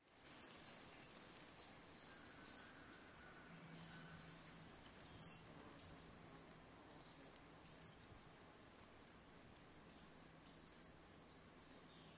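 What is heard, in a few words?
seep calls, Golden-winged Warbler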